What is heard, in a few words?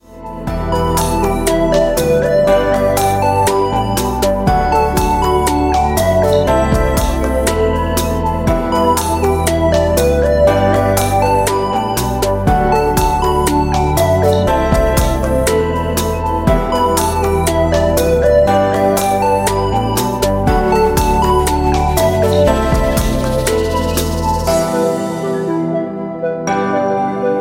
Sad rap Beat; MIUI12